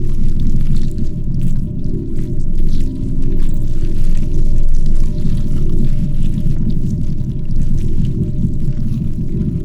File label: ant ship colony idle; Stellaris; biogenesis; ships